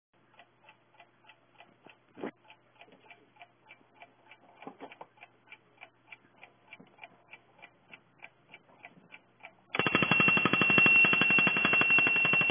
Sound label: Junghans Alarm; ClockGuy